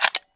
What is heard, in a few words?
tec9 clip in; arccw go